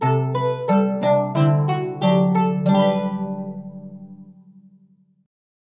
jingle

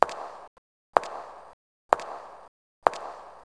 foot

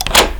door lock

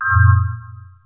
error

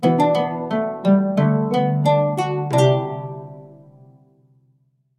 jingle

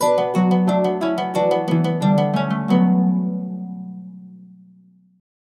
jingle

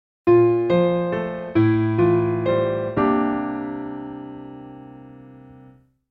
jingle

